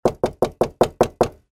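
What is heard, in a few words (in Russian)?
отчетливо костяшками по дереву 7 стуков; Звук стука в дверь для сказки; Для детей, для детских постановок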